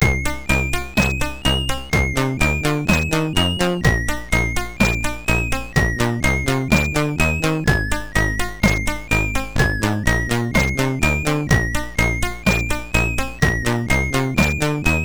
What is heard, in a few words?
MOS6581R4, sid; Search Over